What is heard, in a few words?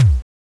Drum Waves; organya-js